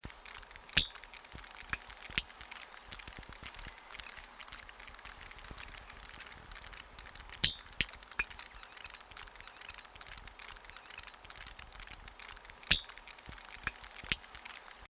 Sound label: sperm clangs, sperm whale; sperm whales